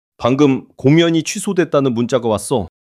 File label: surprise